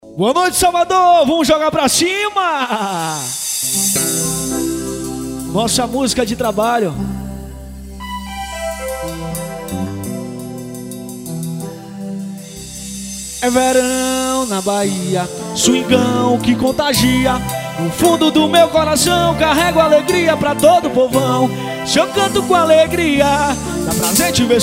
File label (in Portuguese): intro calminha